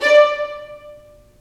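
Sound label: violins marcato, String Sections; Roland LCDP13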